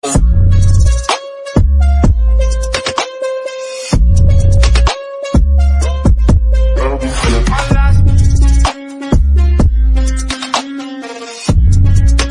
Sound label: english background music